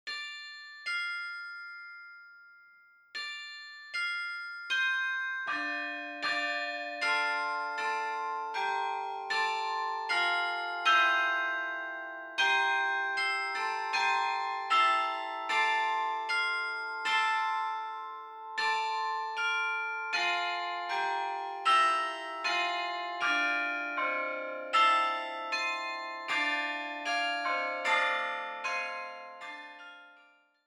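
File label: carillon; Paraphrase on North Port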